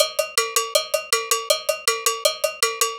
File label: Cow Bell, Latin Percussion; Sonic Foundry (Sony Creative Software); Joe Vitale Latin Percussion